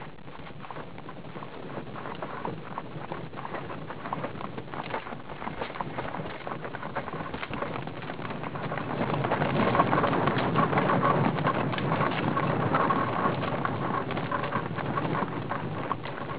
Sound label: horse